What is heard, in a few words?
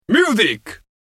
Music; Effetti sonori